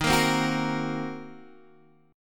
D sharp Minor 6th D sharp down Guitar Standard; strummed; JGuitar

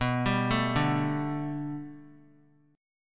B Minor 7th Sharp 5th B, chord; Bm7#5; arpeggiated; 23,26,24,24; JGuitar

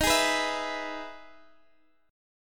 E-Major D sharp

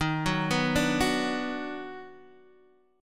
D sharp Minor Major 7th Sharp 5th, Guitar Open D

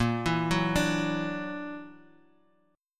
F sharp Augmented 7th, A sharp

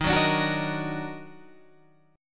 Eb Minor Major 7th Eb down Guitar Open G, chord

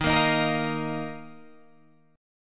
D sharp Suspended 2nd D sharp, Guitar Drop D; chord shape

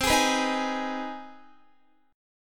C Minor Major 7th Sharp 5th, Guitar; strummed; CmM7#5; 4E,4A,5D,5G,5C,6F

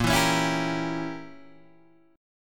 A sharp Diminished 7th Guitar Open G

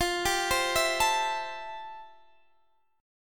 A-Minor 7th F; Am7/F; arpeggiated

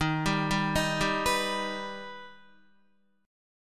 Eb Augmented Eb, Guitar; arpeggiated; 4F,4A,5C,5G,5C,6E

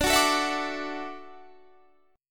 D-Major Add 9th D down Guitar Standard; strummed; JGuitar

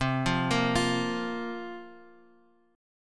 C 7th Suspended 4th

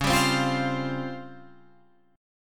Db Major 7th Flat 5th Db down Guitar Open F; DbM7b5; strummed

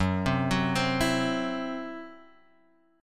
C-Major Flat 5th F sharp, guitar; CMb5/F# arpeggiated

